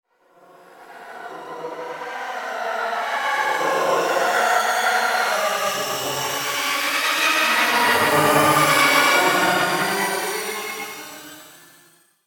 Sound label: Haunted Spirit Music; Ghost Sound Effects; horror sound effect